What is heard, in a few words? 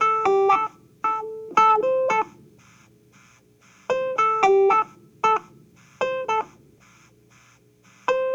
Cruisin' Disco Clavinet, 70s Analog Funk